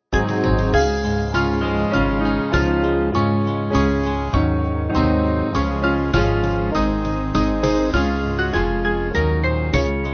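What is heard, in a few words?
Band, Music Backing Files for Churches; Ariel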